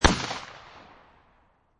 Shot Gun Mossburg 500 12 Gauge Single Shot Exterior At 45'; Exterior, at 45 feet distanc